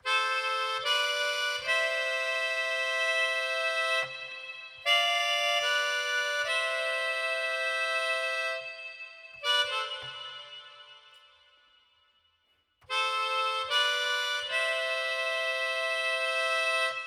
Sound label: melodica solo; PARIGOMUSIC; DUB